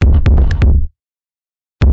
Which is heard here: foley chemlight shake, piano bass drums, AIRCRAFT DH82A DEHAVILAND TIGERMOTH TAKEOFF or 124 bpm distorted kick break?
124 bpm distorted kick break